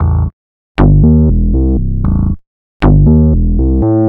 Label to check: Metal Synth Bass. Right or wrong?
Right